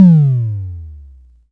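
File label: Analog Kick, dry kicks; Quasimidi Rave-o-lution 309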